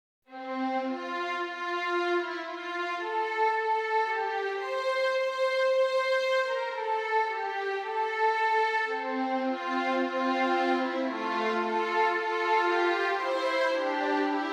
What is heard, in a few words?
INSTRUMENTAL; Hark The Herald Angels Sing